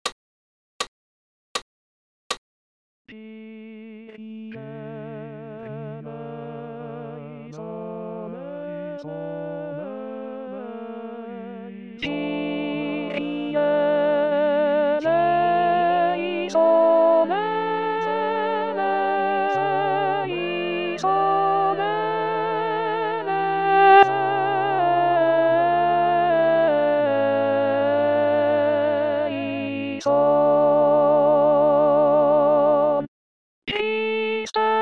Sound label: Alto; Missa Brevis Kyrie; Antonio Lotti